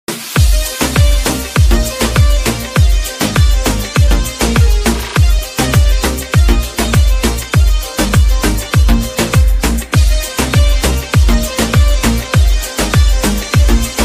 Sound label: Bengali vs Hindi Version Club Remix